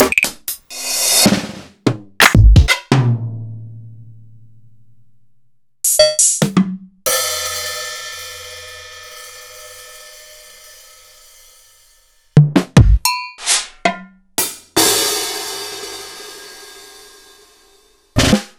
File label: EMU PX-7; Sliced; m8